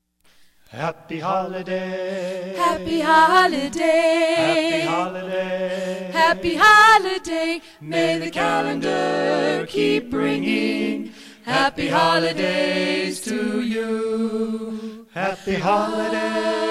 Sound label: Christmas Carolers; Denver